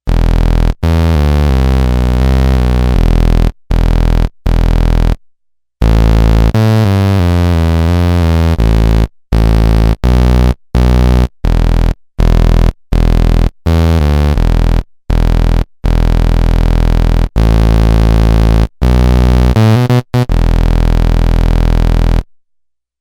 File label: Supr Snoop Bs